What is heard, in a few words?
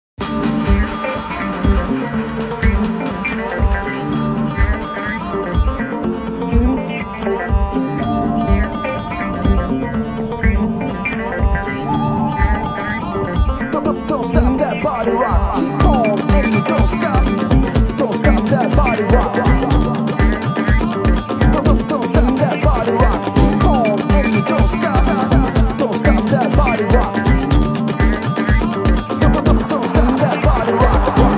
Thunder Beatz Remix; Nokia; 3250; XpressMusic